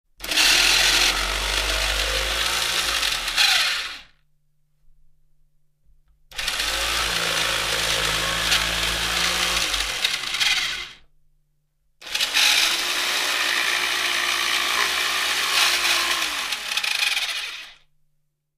Saw Saber Broken; On, Strained Runs While Cutting Wood, Off